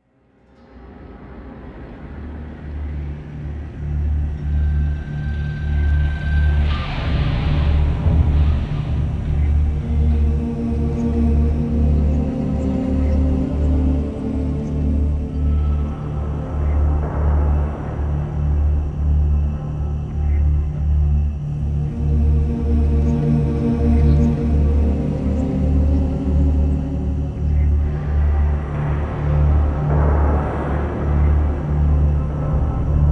Defeat; NetStorm Islands at War

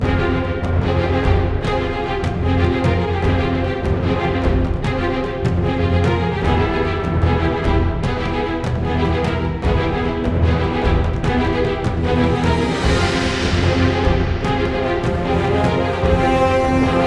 Music Autumn Threat Very High

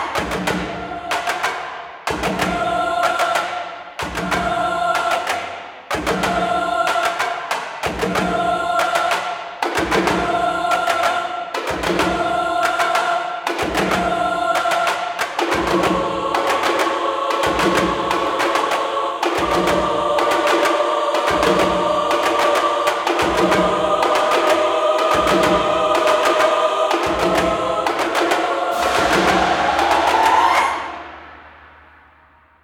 action layer; ice age scrats nutty adventure

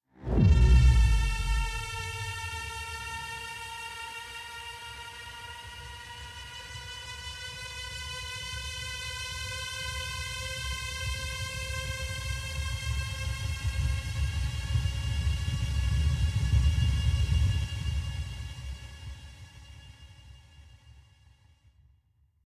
Paris Suspense; Clandestine

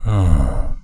Meow

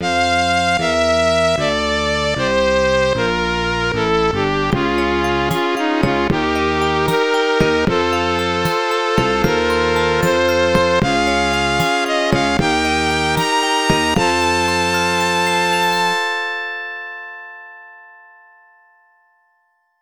Landing Successful; Midnight Landing; arcade

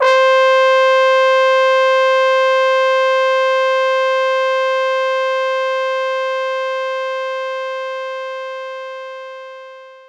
Trombone C4; knights try

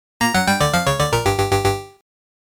Game Over, GAME OVER JINGLE; Arkanoid DS

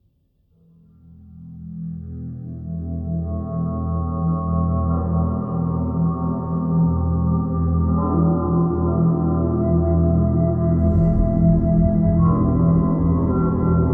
Exploration Long Night; The Long Dark

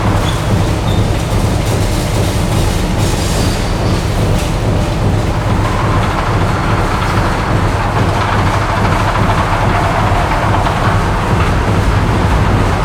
Giant Machinery

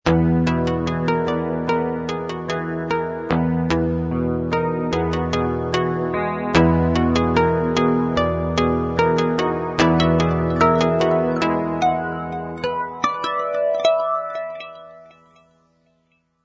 Music Theme

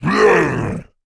Creatures Fight Attack; mighty party 2017 gamerip pc ios android

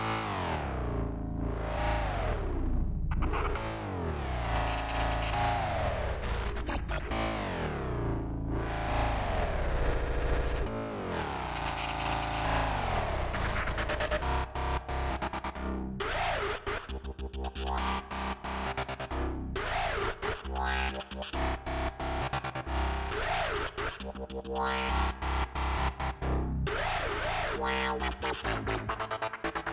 Electronic Layer; Chroma Shift

